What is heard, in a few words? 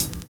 VINYL HH, HH CLSD, Drums Dance & Groove; USB Soundscan vol.11; AKAI